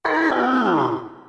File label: Thwomp Whomp